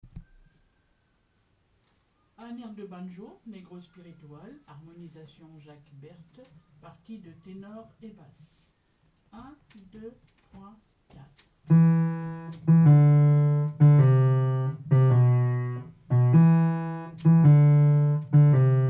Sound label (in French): air de banjo